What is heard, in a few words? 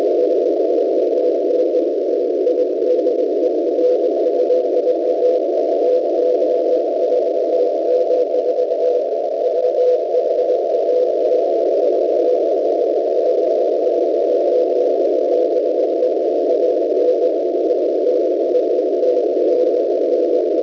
HAARP, 9100 KHz CW